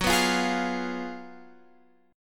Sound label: F sharp Major 7th Suspended 2nd Suspended 4th F sharp, Guitar Open F; F#M7sus2sus4; strummed; x,13,11,12,11,12